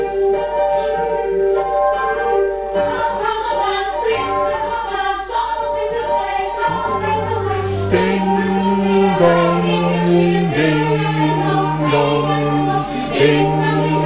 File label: Bass, gospelchor